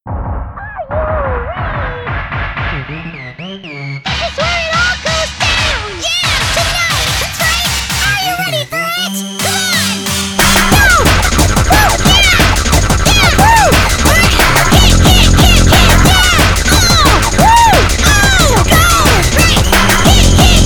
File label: KICK KICK KICK KICK; One Hour Compo - Round 901